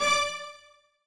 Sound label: buildup synth D7 D6